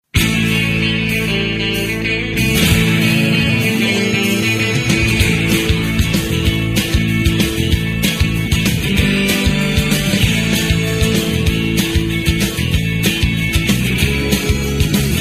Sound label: theme song instrumental ringtone; friends